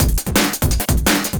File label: Lightning 170, Drum&Bass Breaks; EZ Rollers